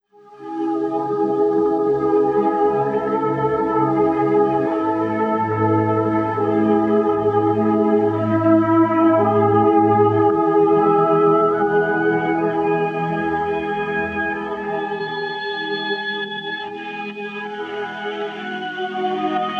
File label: violin concerto 2nd mvt excerpt; barber